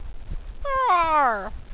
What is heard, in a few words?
Roar